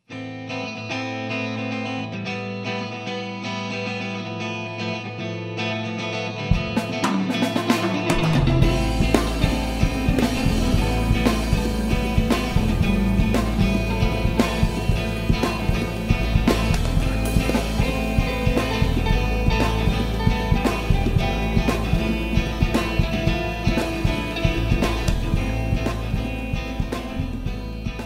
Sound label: Jazz; Mobi-hybrid Musician Templates